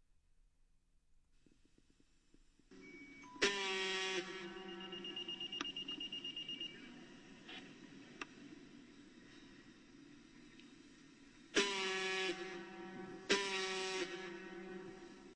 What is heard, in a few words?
diaphone